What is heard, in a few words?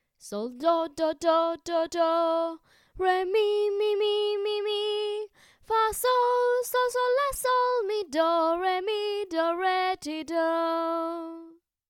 solfa melody; The Noble Duke of York